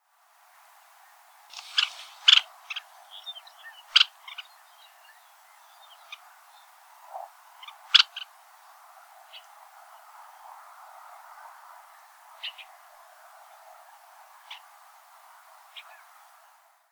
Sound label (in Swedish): Smalnabbad simsnappa